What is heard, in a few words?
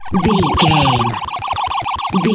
retro vehicle choppy engine